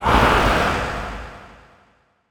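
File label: Distant Abstract Scream Short, monster sounds; The Decline Of Warriors; Monster Sounds Volume II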